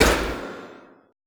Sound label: CONS P, Classical Choir, CONSONANTS; Best Service; Extended Classical Choir